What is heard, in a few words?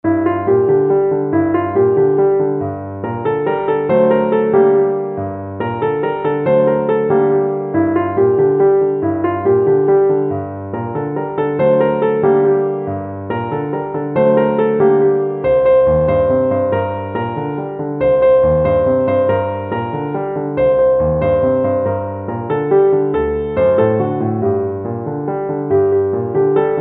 piano